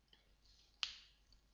shoulder cracking

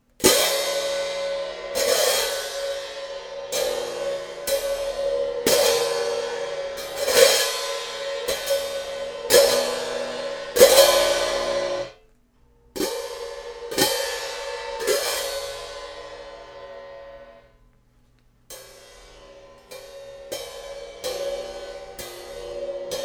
cymbals

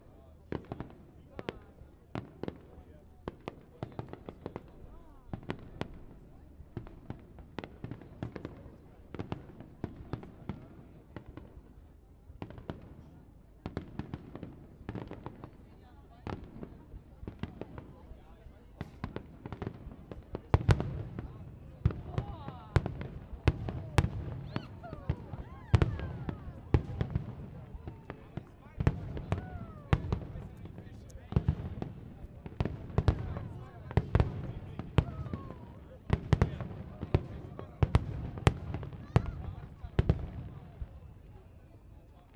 fireworks

